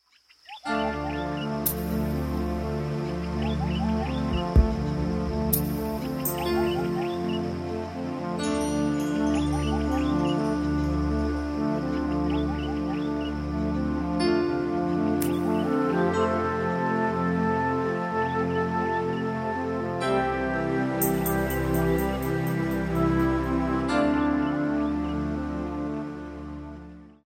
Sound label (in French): MELODIE DES MONTAGNES, OISEAUX & MUSIQUE